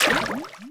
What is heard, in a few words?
Splash Bubble